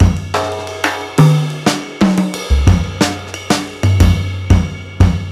Jazz Kit A, electric jazz

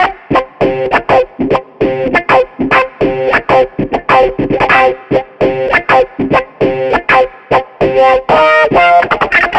Breaky Wah, лупы

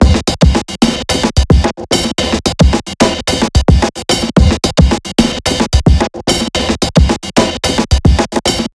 Drum Set, electronica

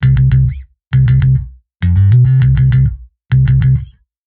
Marching Bass

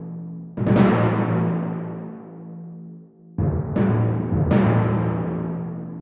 timpani; музыка для фильмов

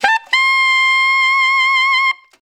funk solo G, духовые

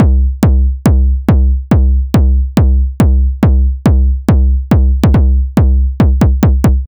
Bass Drum; Бас сэмпл в стиле Hardstyle: Bass drum (Bass Loop); Drums

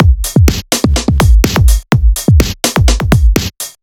Beat Break

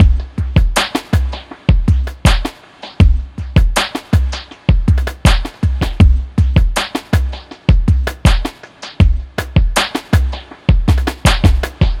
Cave Dweller, ambient; ambient vol 2

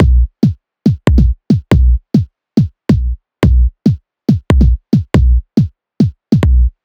Ragstep, 140 bpm

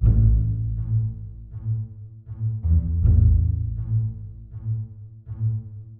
Bass pizz; Сэмплы струнных инструментов для кино: Bass Pizzicato B; Movie Score; 80BPM; film music; Strings

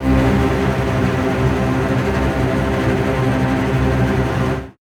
Low tremolo, strings

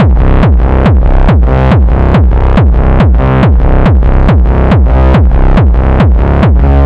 Base Bass, hard style

